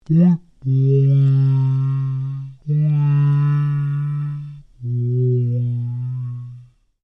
Created Creature Large Vocalise, Alien Sound Effects; Created creatures, vocalisations and pretend speech